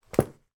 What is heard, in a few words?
sport tennis racket cover sleeve drop on floor; sound effects one